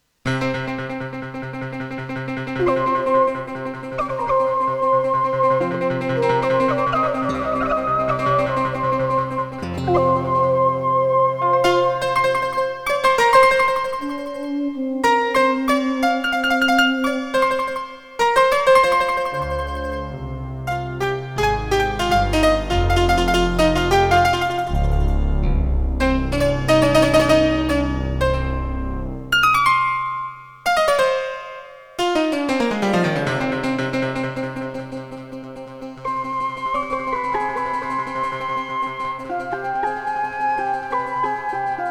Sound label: Roland CM-500 LAMODE; Prince of Persia 2 - Intro